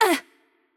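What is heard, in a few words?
Hurt Light, Japanese; bloom minigame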